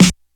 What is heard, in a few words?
Dope Steel Snare Drum Sample F# Key, snare drum sample